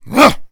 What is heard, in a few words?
Melee Grunt, Human Cop; zps; Humans; MeleeGrunts